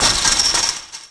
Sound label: bonk chainlink; terrains; Tony Hawk's Project 8; XBOX